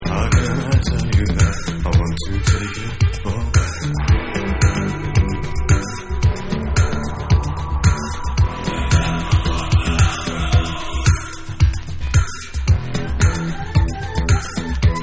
FOOTSTEPS

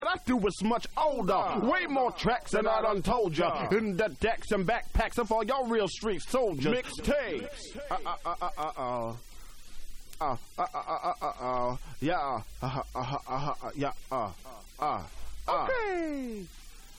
Acapella

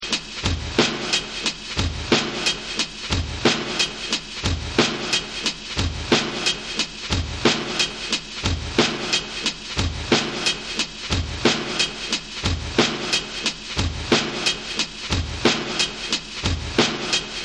Breakbeat

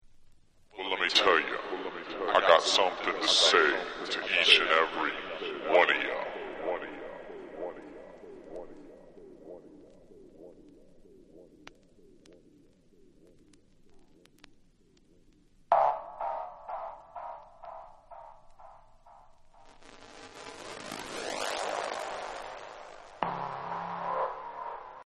Acapella

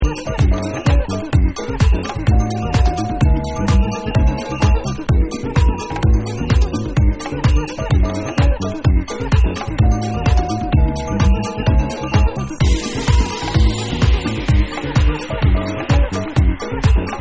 SAMBA Samba Dub

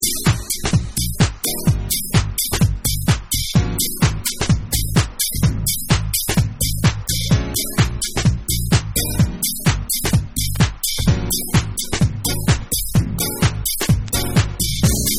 Instrumental Mix

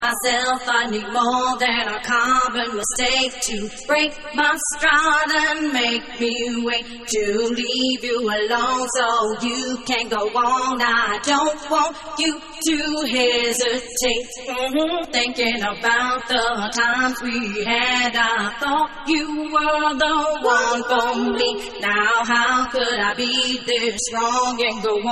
Acapella

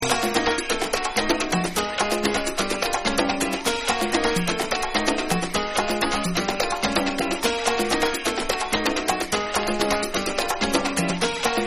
SALSA

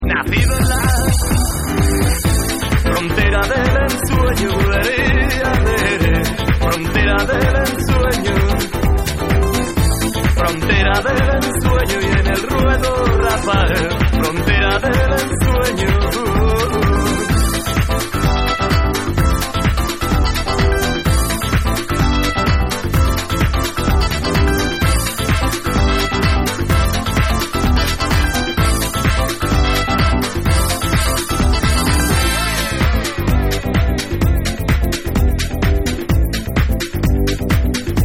Black Latin Soul Remix

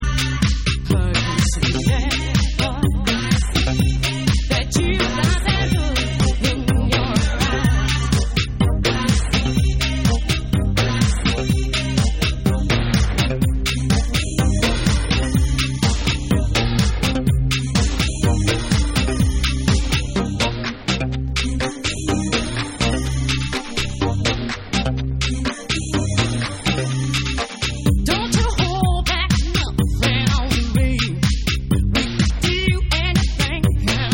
THAT LOOK Vocal Parts

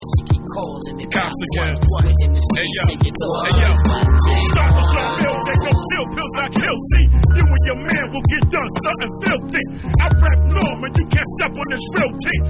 Vocal

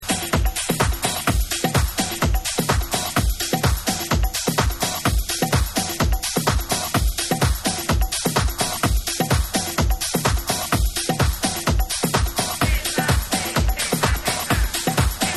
Tribal Mix

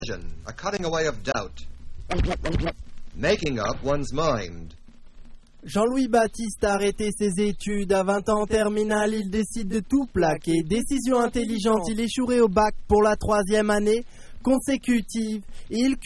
ACAPELLA

